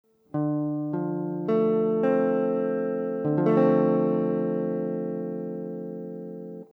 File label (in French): 2eme Degre Dm7